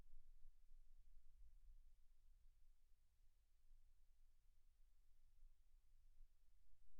silence 48kHz

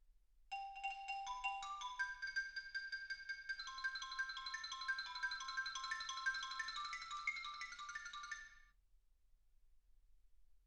xylophone

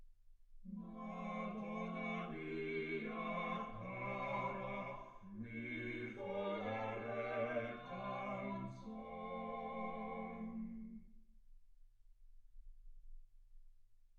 quartet

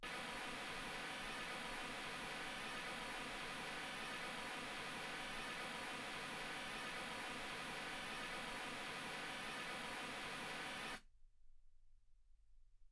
mls16 48kHz; single mic

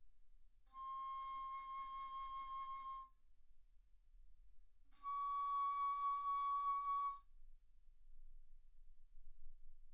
Bass Flute C6 Db6; ff

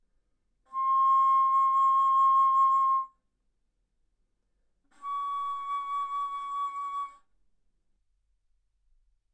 Bass Flute C6 Db6; ff

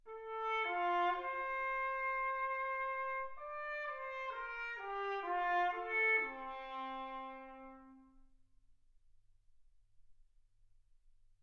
trumpet

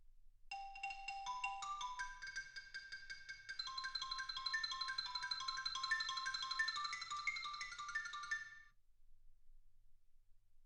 xylophone

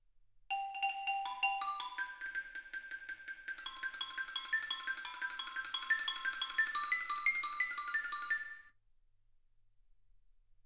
xylophone

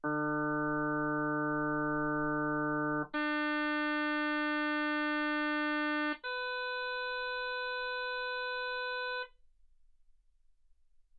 harm sinus 48kHz

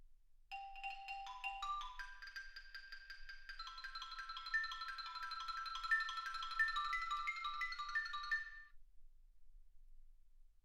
xylophone

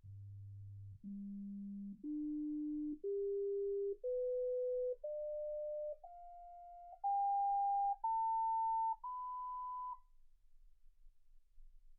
sinus tones 48kHz

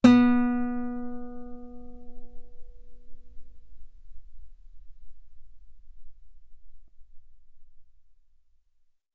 Guitar ff sul B B3

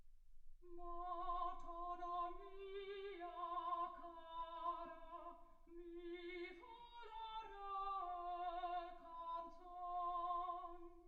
soprano

